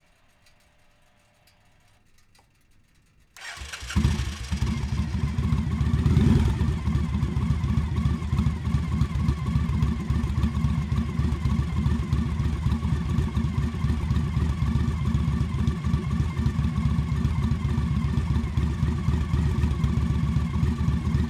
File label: Motorcycle Victory Vegas 96 Cubic Inch 1507 CC Start Idle Off; Airborne Sound